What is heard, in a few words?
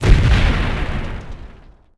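grenade explode; Civ4 beyond sword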